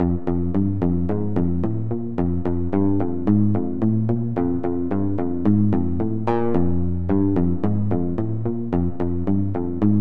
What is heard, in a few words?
martin guns d i tele